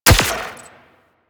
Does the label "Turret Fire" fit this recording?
Yes